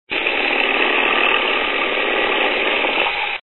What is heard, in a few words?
cougar